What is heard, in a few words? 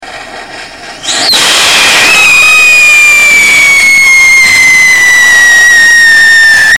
copper oxide sodium benzoate, rockets whistle tests; Copper Oxide (black); Core Burner